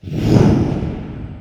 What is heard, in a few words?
terumet heat in; InsaneProtestor; ip terumet